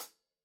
hi hat 14 inch; soundscrate

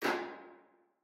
Metal Footfall; soundscrate

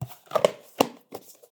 VHS Handling; SoundsCrate

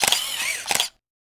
camera shutter classic

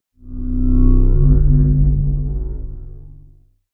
large long rumble fly by; soundscrate

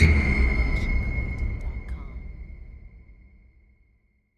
cinematic hit pin drop; soundscrate

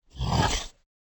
dinosaur chomp; SoundsCrate

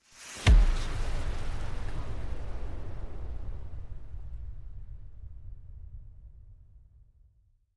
Cinematic Hit

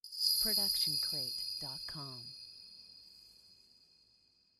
Shimmer Hit; SoundsCrate

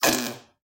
Fart Noise; SoundsCrate

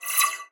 scifi weapon blaster accent multiple

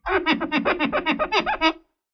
squeaky shine polish; soundscrate

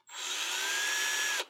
drill fast short; SoundsCrate

